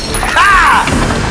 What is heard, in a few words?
hahh; Broken Arrow